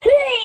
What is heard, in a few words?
huh kid, Voice; ooh ahh; heh huh